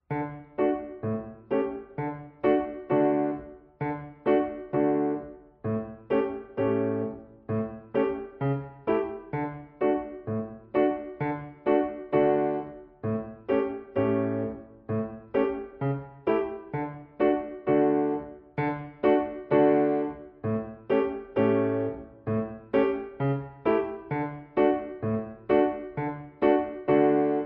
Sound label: peggy polka playalong